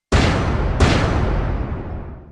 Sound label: not guilty